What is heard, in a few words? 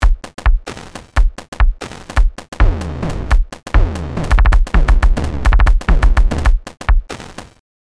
TR 707; Roland Cloud